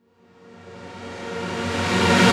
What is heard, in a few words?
Reverse Synths F#maj; Effects; Vengeance; Essential Clubsounds Vol.4